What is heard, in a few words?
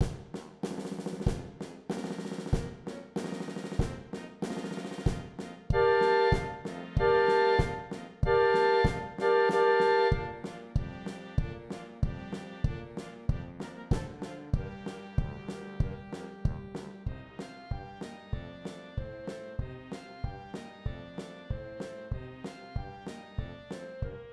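percussion, sein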